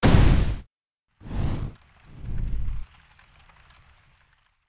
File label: Fire; Movie Dolby Digital Fire Trailer